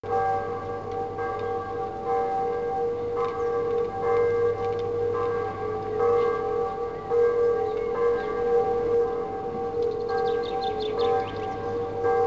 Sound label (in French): Cloche d Asnieres